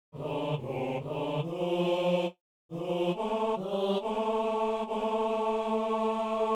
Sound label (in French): exauce nous seigneur de gloire, basse